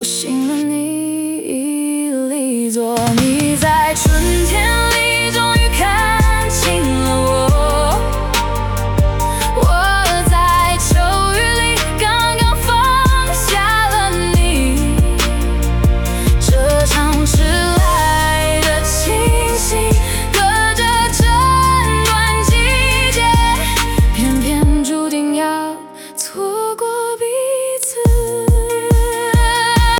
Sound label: hook mixed